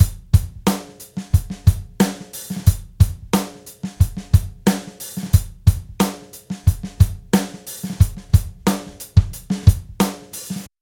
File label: Punch - Acoustic Drums - Acoustic Drums Punch